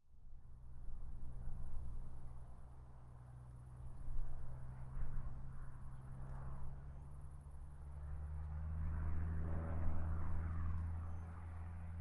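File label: cessna 172 exterior fly by; ortf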